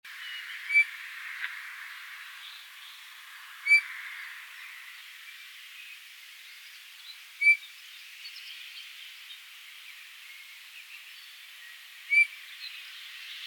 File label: Pyrrhula pyrrhula; SETE